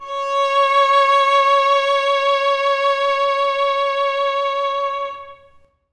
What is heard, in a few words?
violin c#5; Sonatina Symphonic Orchestra